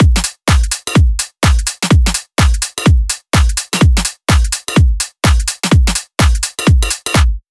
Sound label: G House Full Drum Loop 126 BPM; Drums; Loops; Cymatics; House Starter Pack